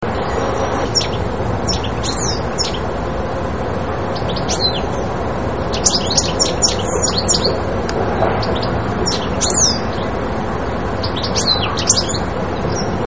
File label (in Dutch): putter; vogelsflevopark